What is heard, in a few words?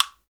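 perc afro, gourd guiro; Roland LCDP14 Africa VOL-2; Afro Toys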